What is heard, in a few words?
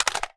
g36c mag out empty; weapons